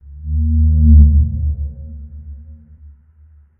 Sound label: moan, ambient; STALZONE